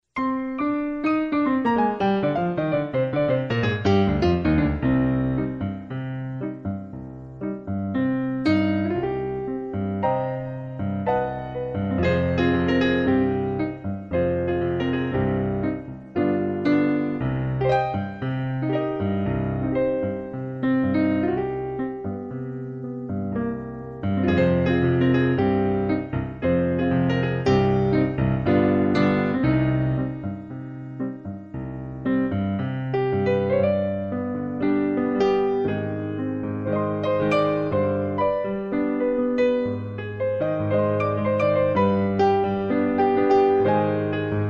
rhumba

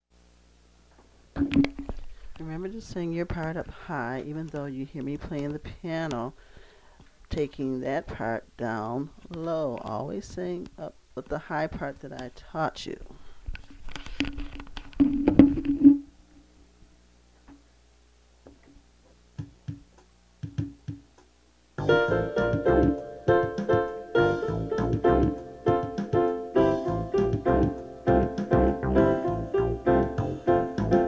noise music

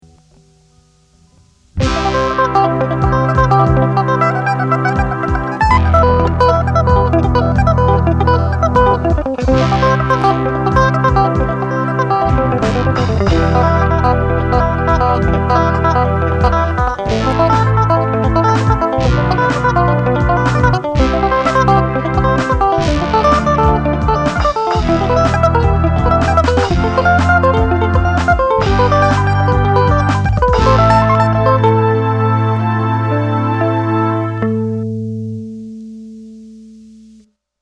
Mix Arpeggios

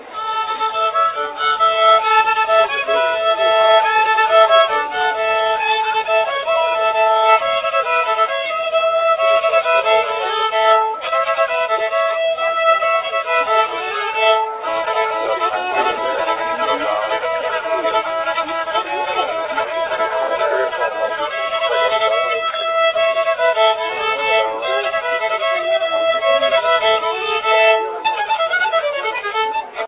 fiddle

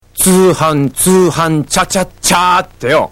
chachacha

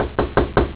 door knock

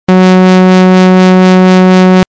lfo cutoff

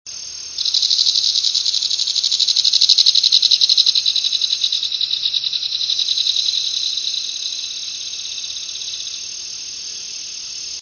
kingfisher; birdsongs

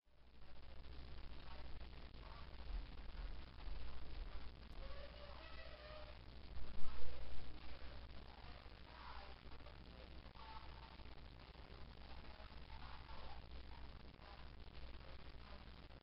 ambience; baldis basics

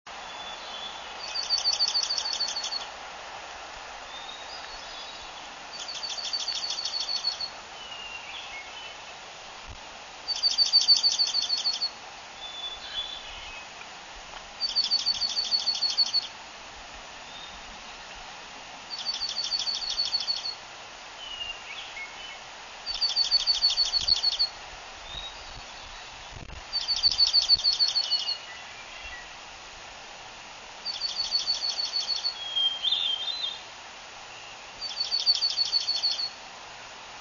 junco song, birdsongs

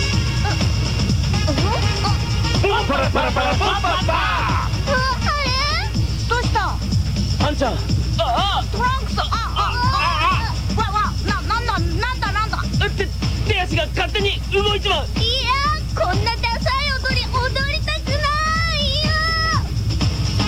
disco

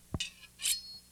blade

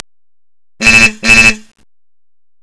horn; ford

